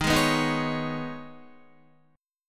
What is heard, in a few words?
Eb 7th Suspended 4th Sharp 5th, Guitar; Eb7sus4#5; strummed; 11,11,9,8,8,8; JGuitar